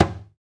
DUMBEK, INDIA PERC

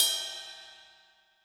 ride, Zoom MRT-3B

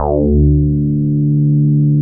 BOW BS D3

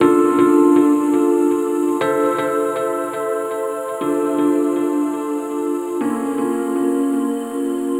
Pad, Trance

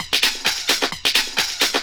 drum 130, techno trance drumloops

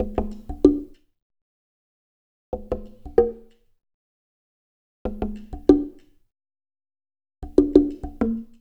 ABO SLITS, ETHNIC INST; Spectrasonics; Liquid Grooves 1 & 2

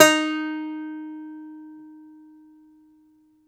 DOMRA, Russian Strings

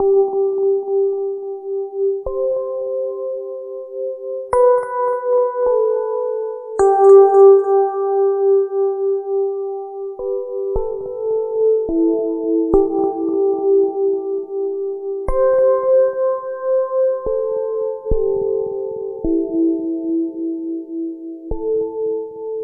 Pendulum Piano; Sonic Foundry (Sony Creative Software); Loop Noir

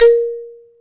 XYLO A#3; XYLOPHONES; AKAI S-Series CD-ROM Sound Library VOL-7

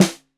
Snare 65 Reg Stereo, Deeply Sampled Drums

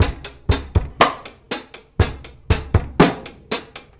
Live Beats, R&B Drums

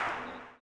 CLAP, blues & soul; ILIO; Vocal Planet

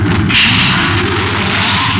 STAR WAR; East West - Global Sounds; STARS FXS

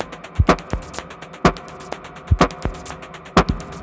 125bpm, House Drumloops; 90 s Sample CDs; Zero-G House Fabrik Drumloops; ZG Drumloops

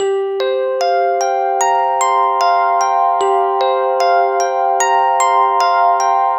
Lead Rhodes Line, Drum & Bass Keys; Sonic Foundry (Sony Creative Software); Knutrix Drum & Bass

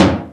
Timbale Lo, Fairlight CMI III

